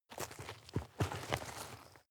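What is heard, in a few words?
step dirt heavy boots scuff, footsteps; schritte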